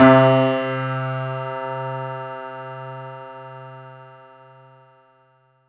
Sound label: C3, synth; 90 s Sample CDs; NiceBeats; SynthLine VOL-23